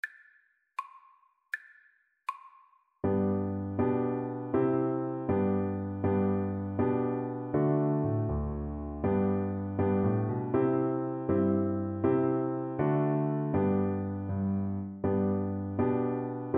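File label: viola karaoke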